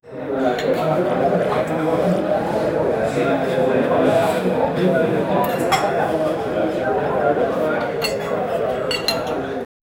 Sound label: medieval tavern ambience